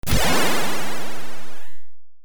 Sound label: SLOT LAUNCH (go); Twilight Zone Pinball